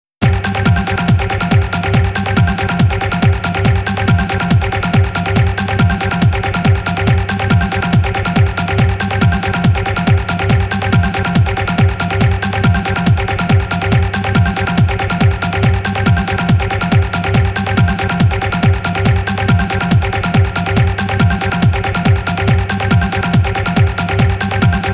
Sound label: Karimba; Yume Nikki; Yume no Oto